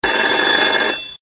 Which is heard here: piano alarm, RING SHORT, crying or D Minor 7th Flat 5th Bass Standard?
RING SHORT